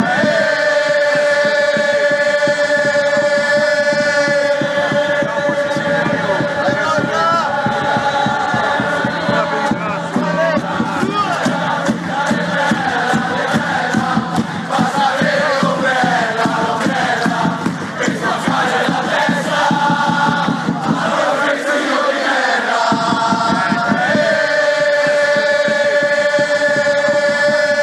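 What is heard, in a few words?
best pisa chants, football chants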